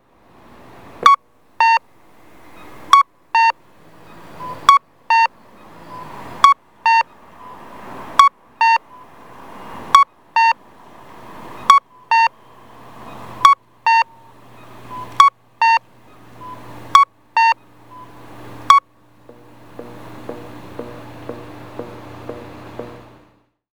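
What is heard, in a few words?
cuckoo, audible traffic signal, intersection; nagoya U-B; onkyo kosaten; aichi; inazawa; konomiyaekimae; kakko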